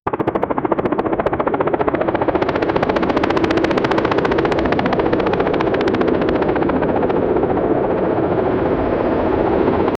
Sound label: helicopter flying; blades